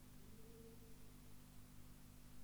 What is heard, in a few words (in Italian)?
mugugno isolato